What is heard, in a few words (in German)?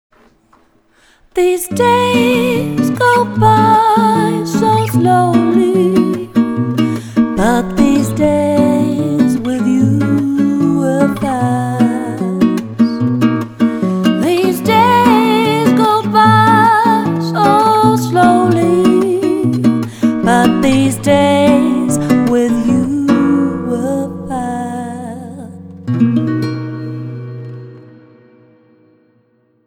bossanova, gesang